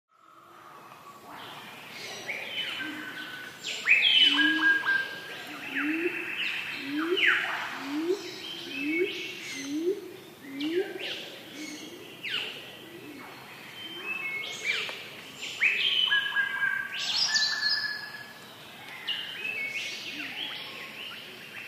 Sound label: rainforest sanctuary; andrew skeoch